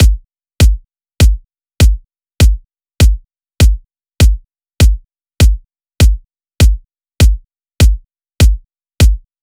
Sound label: Drum Loop - 100 BPM Kick, Latin; Loop Stems; Cymatics; PARADOX II - Drum Loop Collection; Flame